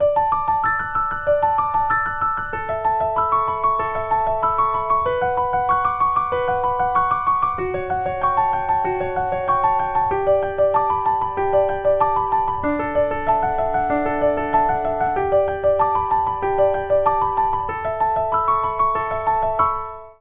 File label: new age Hanon exercise